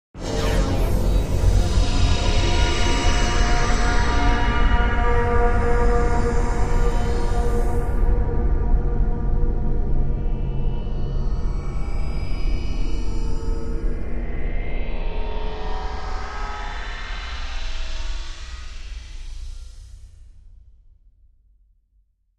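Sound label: Stab Electronic Buzz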